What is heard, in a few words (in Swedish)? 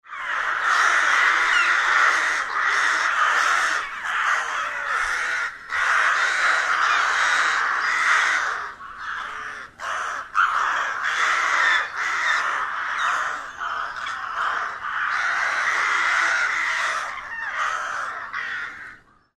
Raka